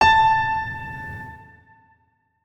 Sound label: notes, keyboard piano; Hideout Furniture